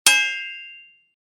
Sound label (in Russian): udar; Звук мечей; Звуки сражений на мечах, звук ударов меча